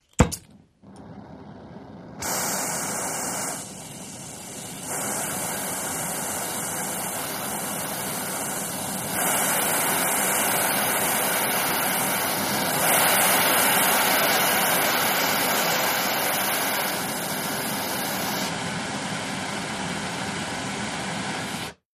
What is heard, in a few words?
Welding, oxygen - acetylene, ignite & burn, varying flame